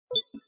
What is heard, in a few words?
menu touch; soldiers combat